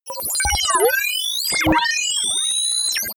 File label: interface calculate